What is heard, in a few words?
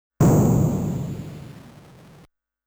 Explosion; Namco Classic Collection Vol.2; arcade soundtrack